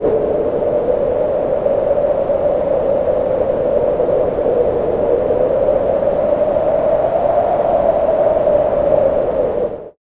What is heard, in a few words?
wind; Nyquist Example